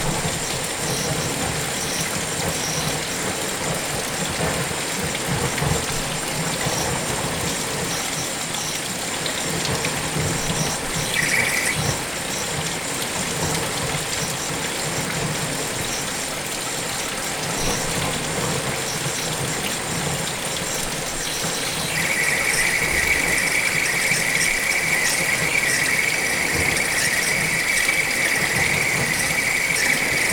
ket fele hang a viztol, vizakna; thassos 2016; potamia

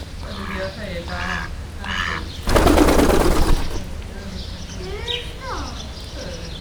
földről fára felszáll, tőkés réce; Győri Zoo 2014

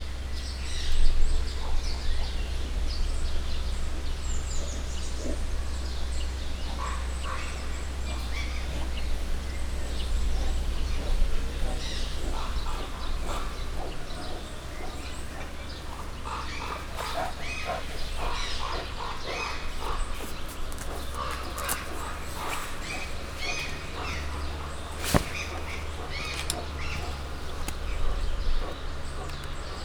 cammogva erkezik, barna medve; miskolc zoo

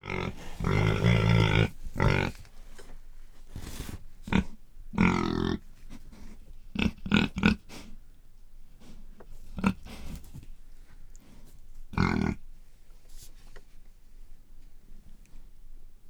hangulatos röfögés, házisertés; Egerturistaház

